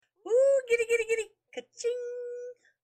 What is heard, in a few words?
kaching